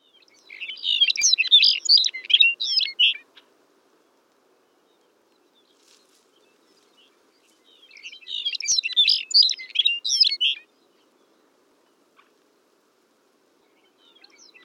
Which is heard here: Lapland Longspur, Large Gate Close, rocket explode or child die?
Lapland Longspur